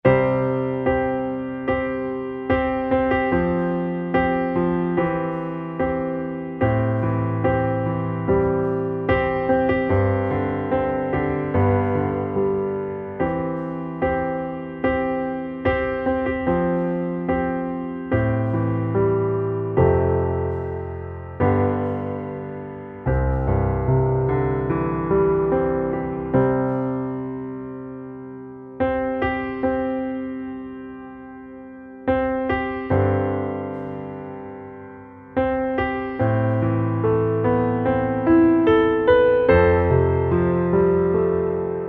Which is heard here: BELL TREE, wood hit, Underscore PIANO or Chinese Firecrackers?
Underscore PIANO